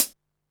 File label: HAT, Techno 138 BPM